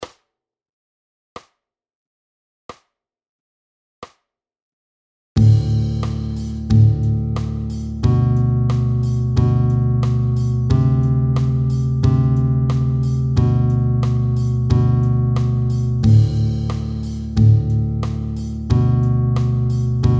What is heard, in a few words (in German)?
F Rock 90